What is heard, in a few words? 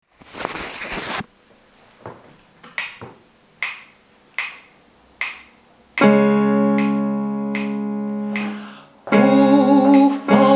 tenor; hostivarsky koncertni sbor